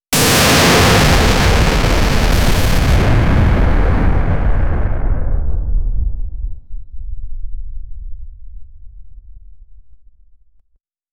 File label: escalating ionic charge